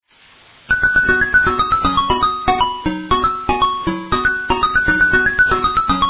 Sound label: Jamaican Rhumba